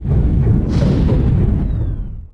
battleship run; Sid Meier's Civilization 4 Complete; Beyond the Sword; Final Frontier; Units

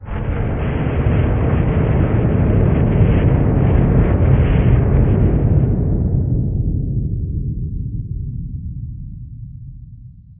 rumble, caves; ambient, levels

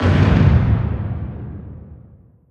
distance light armour fire; Hearts of Iron IV Death or Dishonor

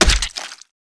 body medium impact soft

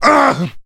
man hit; Mount&Blade Warband

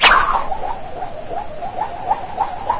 rope shoot, tripwire; weapons; Garry's Mod; hl2

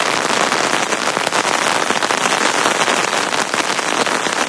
rain on tarp; ambient; ambience

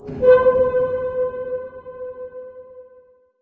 metal scrape verb; random amb sfx

